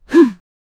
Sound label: realistic male jump exert